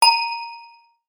radio; CosmicRage; comms

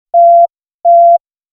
link request; comms; CosmicRage

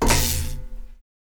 electric

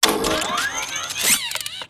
drone activate, factory; CosmicRage

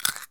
crunch; CosmicRage; socials

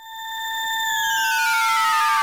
wine, mechanical mining

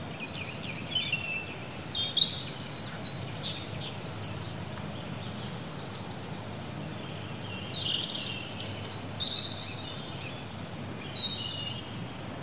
mountain, ambiances

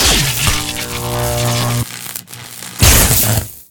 stun in, military

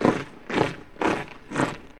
march

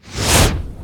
fire; CosmicRage; combat; bigbite

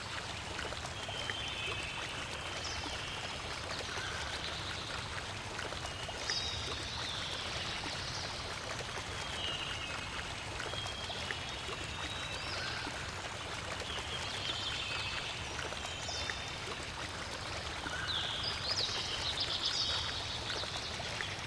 lake, ships rooms; CosmicRage

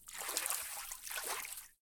fish line, fishing; Cosmic Rage

